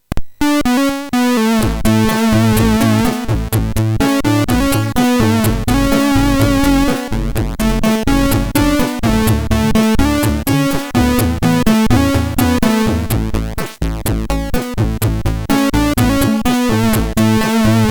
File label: Breath, MOS6581R4